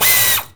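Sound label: Air ratchet, air tools, industrial; Air toolks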